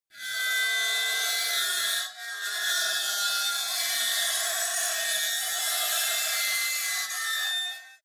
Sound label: metal lid screech